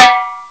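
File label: TIMBALES; CMI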